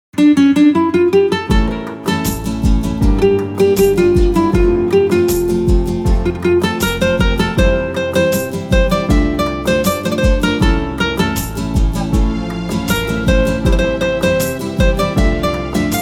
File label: Guitar Cover